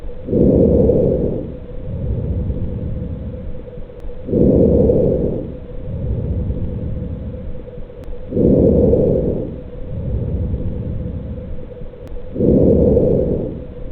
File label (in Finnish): vesikulaarinen, hengitysäänet; ääninäytteitä auskultaatiolöydöksistä